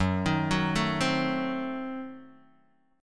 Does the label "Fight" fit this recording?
No